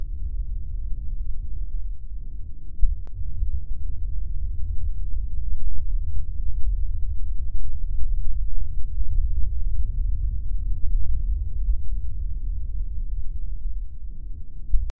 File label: ambient background sound